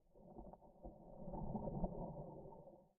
Scuba

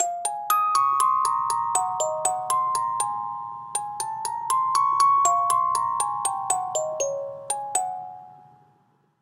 Music Box; Assassin's Creed Syndicate

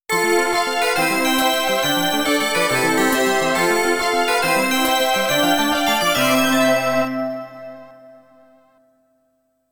GAME OVER

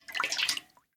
Cookies Milk Scooped

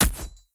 Attack Spear

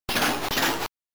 machine break

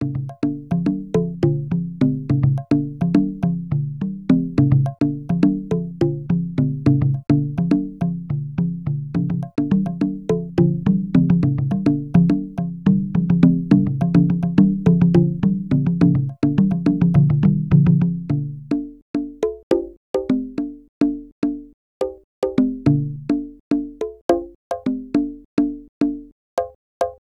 Cave Bongos

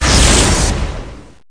fire rocket

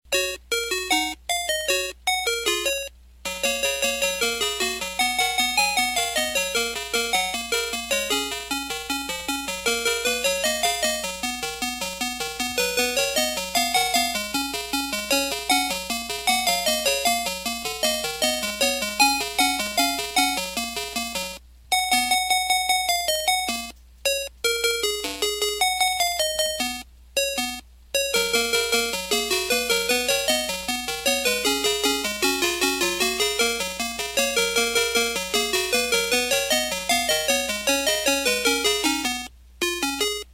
arcade frogger music radio; Frogger in Toy Town

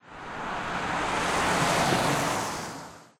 Car Pass By; hello neighbor pre-alpha alpha 1

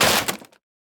Break Wood Impact; boomerang fu

